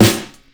boom bap hip hop acoustic snare sample a key, Royality free snare one shot tuned to the A note. Loudest frequency: 2204Hz; boom bap drums